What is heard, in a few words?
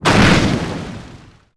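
impact builds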